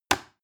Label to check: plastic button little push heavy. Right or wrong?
Right